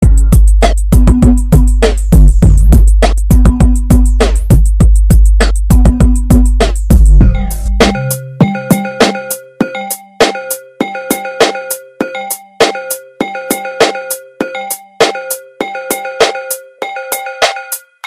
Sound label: Windows New Ring Tone